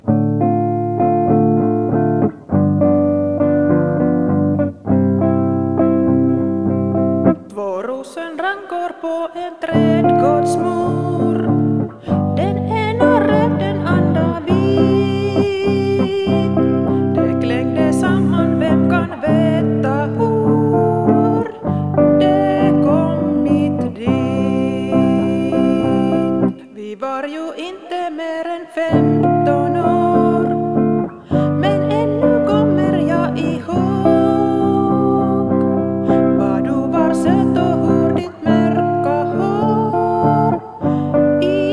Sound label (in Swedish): sopran